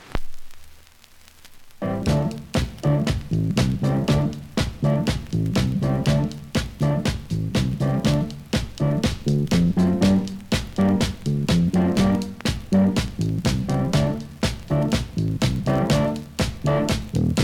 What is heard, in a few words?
Fife Piper